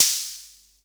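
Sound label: SR95 snare drum; Best Service; Dance Mega Drums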